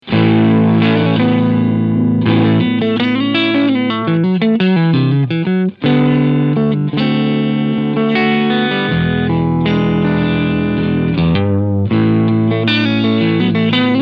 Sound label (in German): Crunch EQ 12 Uhr